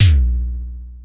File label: PTX PER; Crypton - DrumBase5000